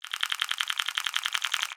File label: count up